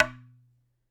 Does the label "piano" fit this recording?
No